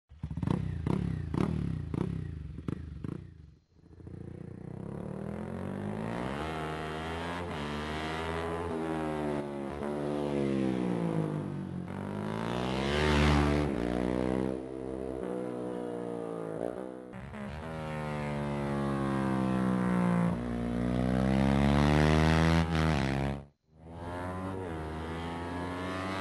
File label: Ducati 550 Supermono